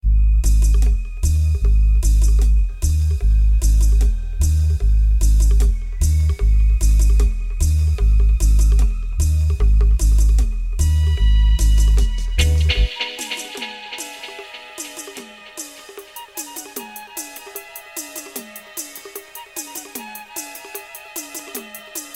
Dub Flute Dub

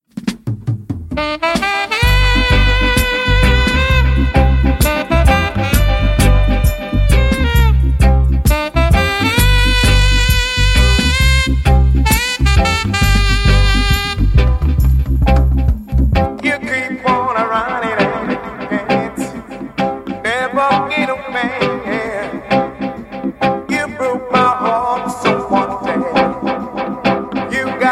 Keep On Dubbing

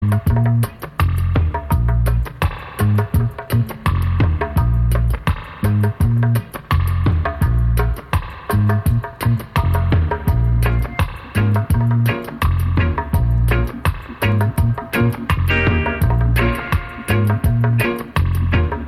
Drum Song Dub

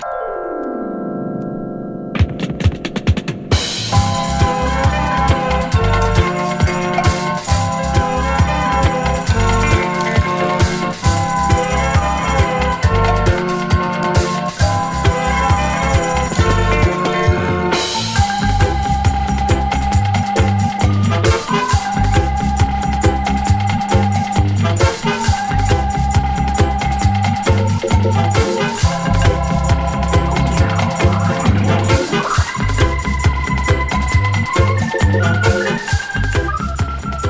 Far East Organ

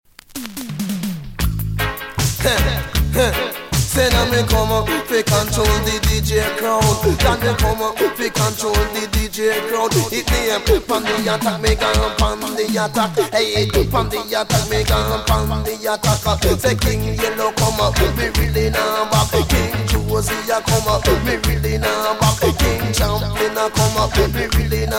Dj Crowd; reggae; roots

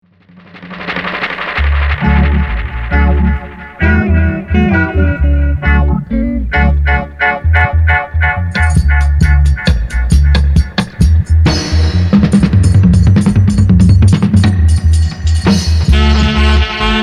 Aggrovating Horns

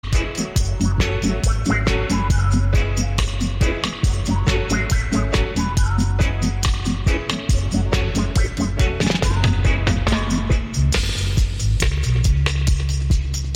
Every Dub Shall Scrub, reggae dub

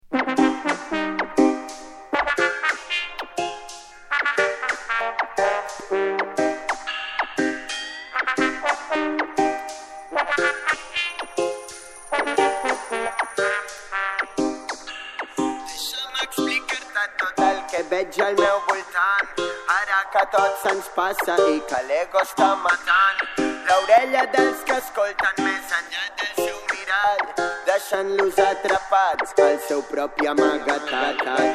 Switch Dub

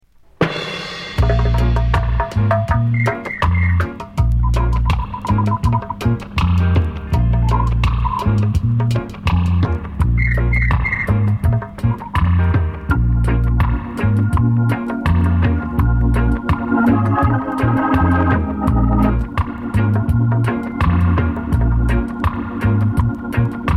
Drum Song; dub

